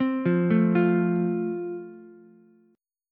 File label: F Major, Ukulele; arpeggiated; chord